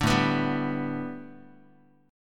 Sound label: A 7th Suspended 2nd Suspended 4th, Guitar; A7sus2sus4 strummed